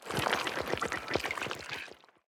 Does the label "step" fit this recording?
Yes